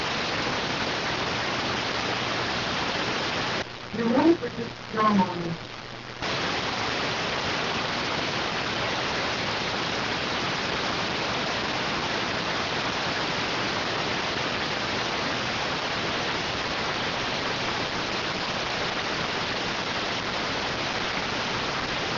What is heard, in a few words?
rtty rx